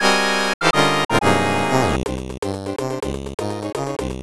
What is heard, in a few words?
Battle; SMW